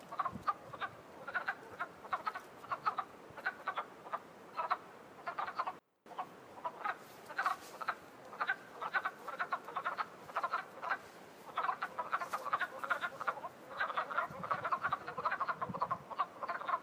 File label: Wood Frogs